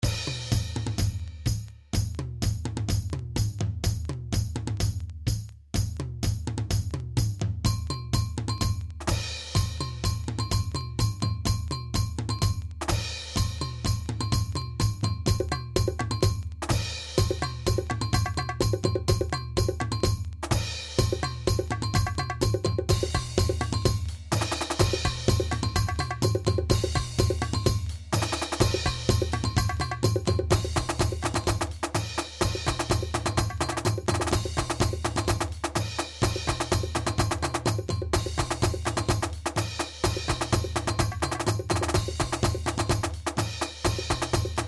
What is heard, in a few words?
beats, drums